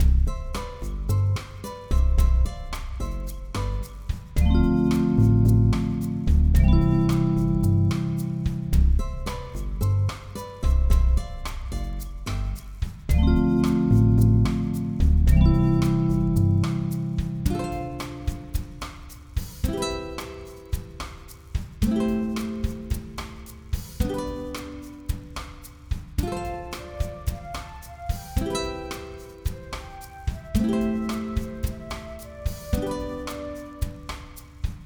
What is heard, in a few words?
menu; fife-parpg; rio de hola